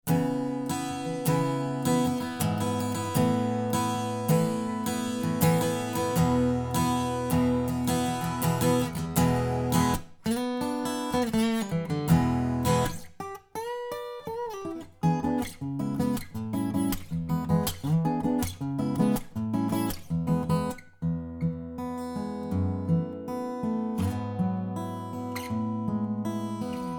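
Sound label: Acoustic Guitar 2 Over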